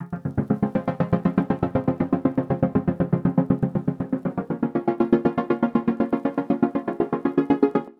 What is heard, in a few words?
120 F# stutters, Music Loops; Touch Loops; BlissedOutBeats